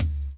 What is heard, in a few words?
KIT, Dance Drums; Best Service